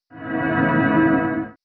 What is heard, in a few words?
alert